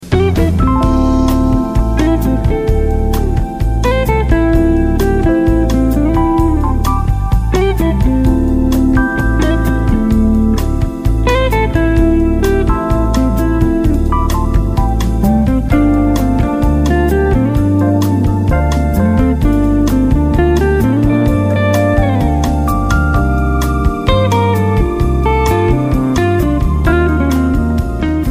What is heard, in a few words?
Slow Samba